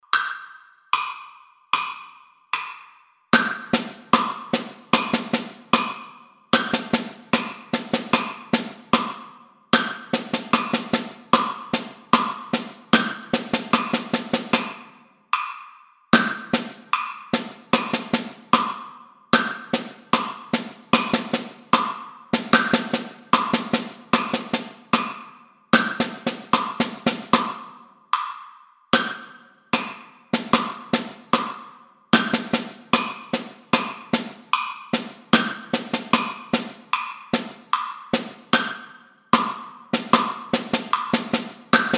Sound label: drum set